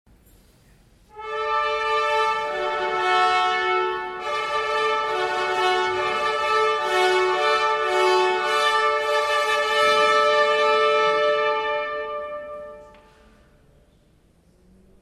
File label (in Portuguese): marcha nupcial clarinadas